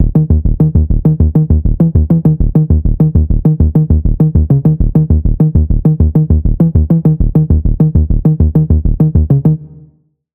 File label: Pulse